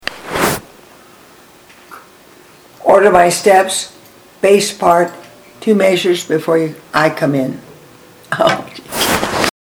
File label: bass